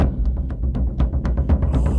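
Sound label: Music Shield; From Dust; PS3; Xbox 360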